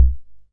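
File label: bass drum, Hammond Auto Vari 64, drum machine; 1974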